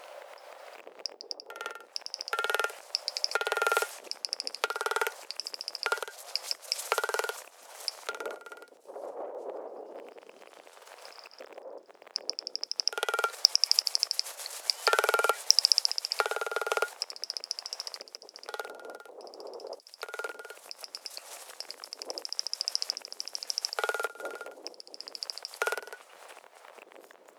Magnificent Frigatebird Fregata magnificens magnificens